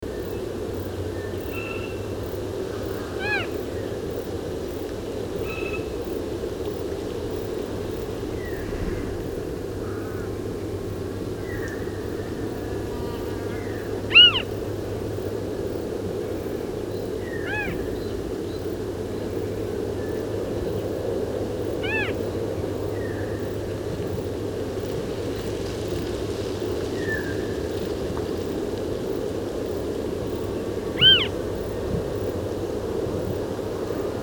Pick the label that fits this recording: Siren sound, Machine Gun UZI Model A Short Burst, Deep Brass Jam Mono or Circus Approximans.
Circus Approximans